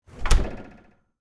fridge close, kitchen

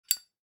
zippo open; nature

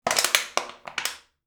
can crush; drinks

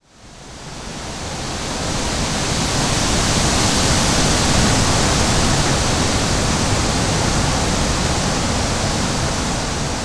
wind breeze; nature

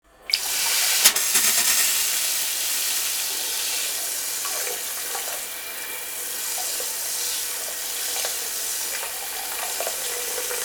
hand held bidet; bathroom

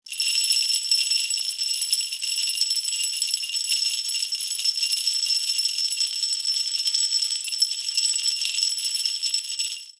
jingle bell

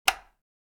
switch

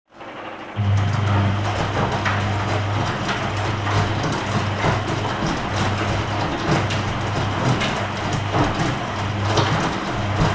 washing machine

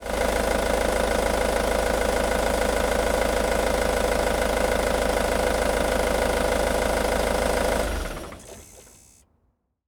van idle and shut off; transportation

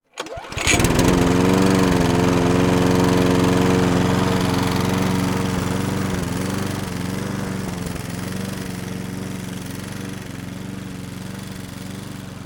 lawn mower

